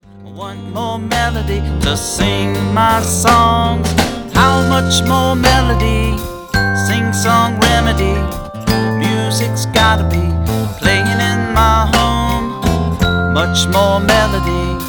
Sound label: Much More Melody